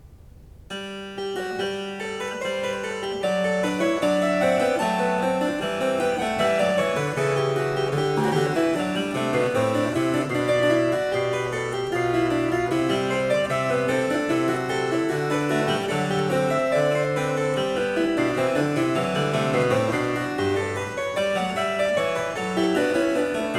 Cembalo; Variatio 12 Canone alla Quarta (a 1 Clav); Bach; Goldberg Variations

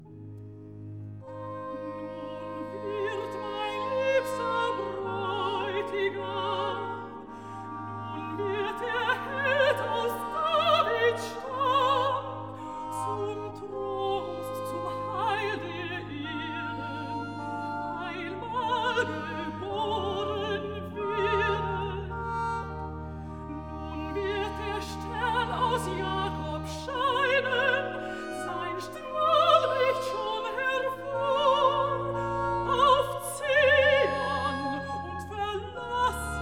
Recitative; Nun wird mein liebster Brautigam; BACH; Christmas Oratorio

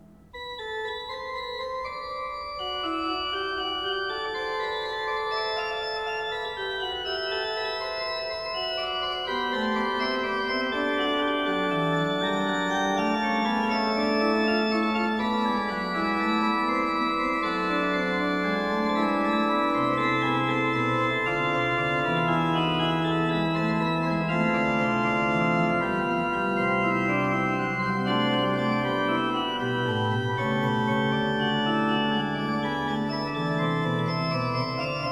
Kyrie Gott heiliger Geist, organ; Orgelmesse; Bach; Bach Organ Works; Choral Preludes

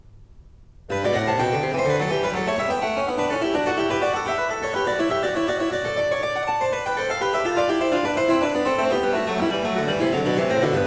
Variatio 8 a 2 Clav, Cembalo; BACH; Goldberg Variations

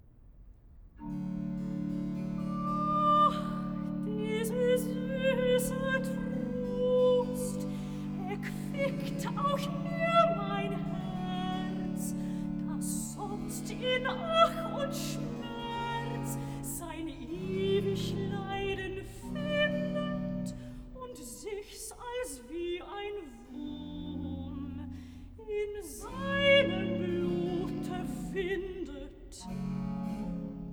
Recitativo Sopran; cantata; BWV 057; Selig ist der Mann; Ach! dieser süße Trost

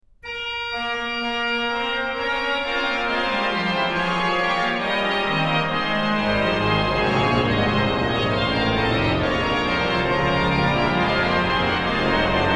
ORGAN, Chorale Preludes; BWV0613; Helft mir Gotts Gute preisen; BACH; BACH ORGAN WORKS

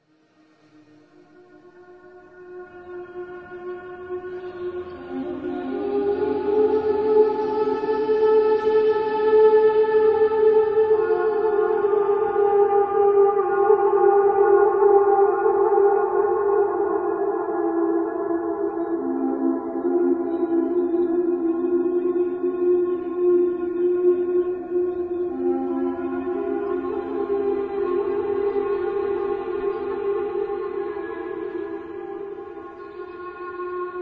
Flute Interlude; ambient

